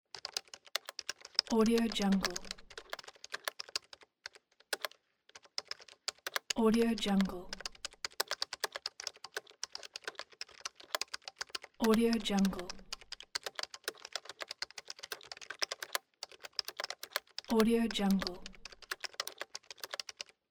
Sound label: keyboard typing